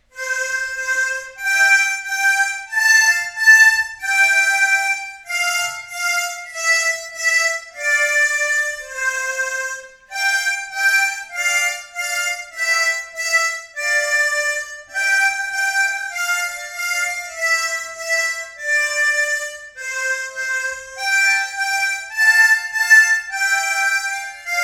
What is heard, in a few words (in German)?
morgen kommt der weihnachtsmann mundharmonika